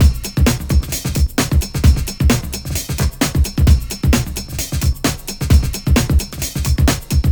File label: 4bar 131 (s) + adds